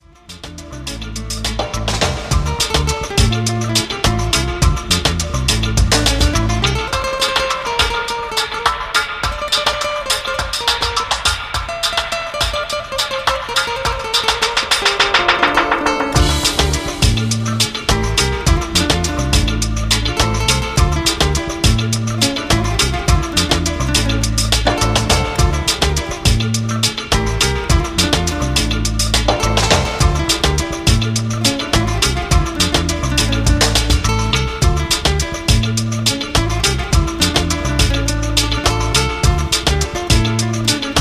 Alternative Dub

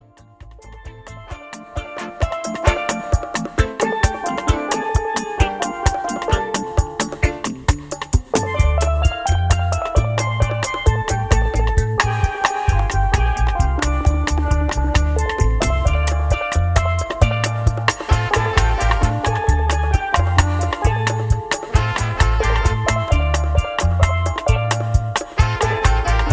Fanfare Dub

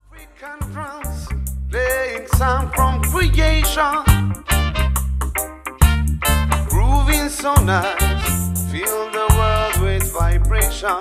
African Drums

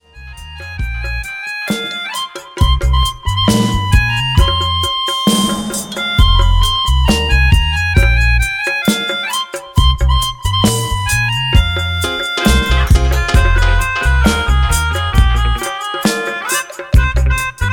Reggae Music - Dub Version

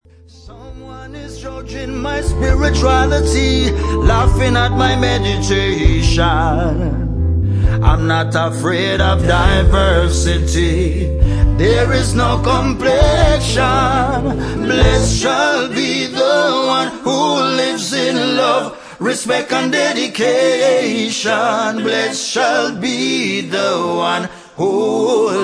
Acoustic

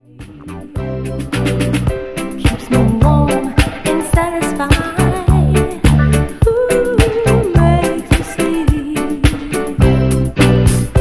Sweet Drum And Bass

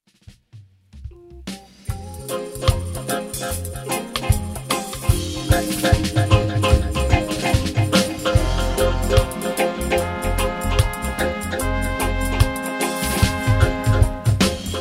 Steel Drum

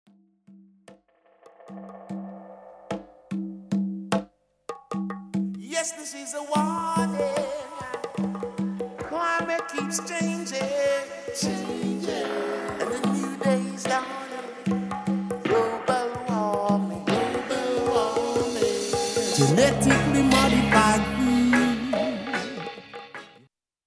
Dub

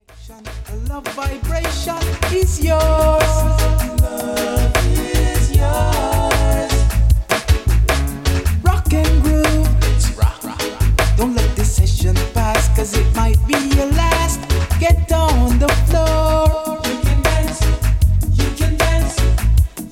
Reggae Groove

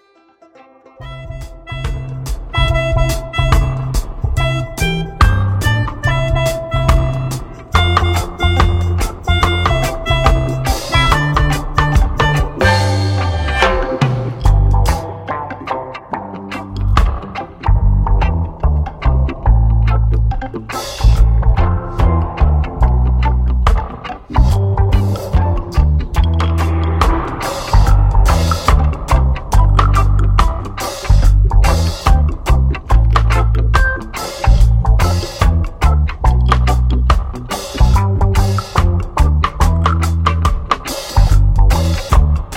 Jingle Bells Dub

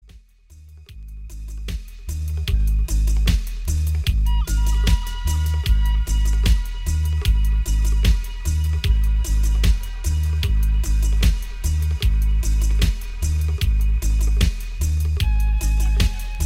Dub Flute Dub

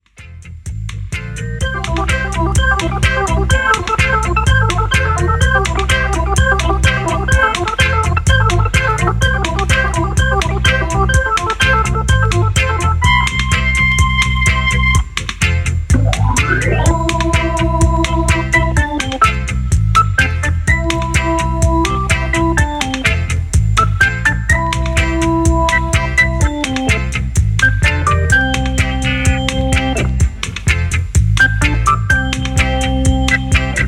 Bubbling Organ

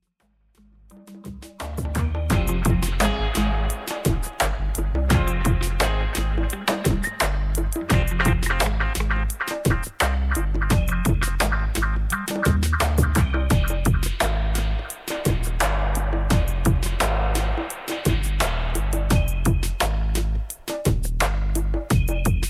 Drum&Bass Live

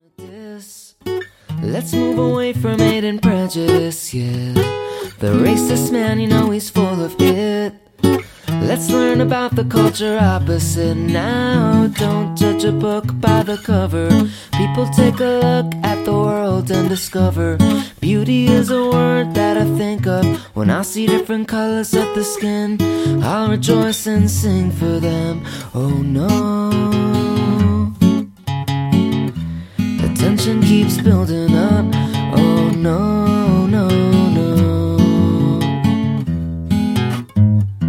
Good Vibes Acoustic

